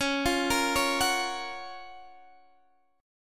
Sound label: F sharp Major 7th